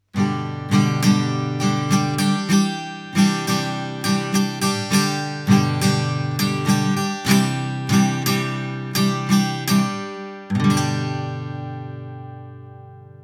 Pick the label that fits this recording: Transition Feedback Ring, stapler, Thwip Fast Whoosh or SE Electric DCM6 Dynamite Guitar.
SE Electric DCM6 Dynamite Guitar